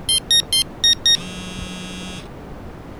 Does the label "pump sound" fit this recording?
Yes